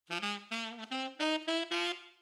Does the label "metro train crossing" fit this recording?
No